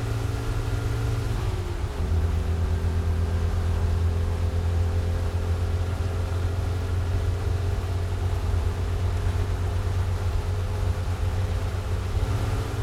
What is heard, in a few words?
Car Speed; Game rip; MiSide